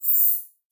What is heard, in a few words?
ribbon on; tetrio